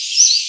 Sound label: wefl or song2; Passeriformes; Tyrannidae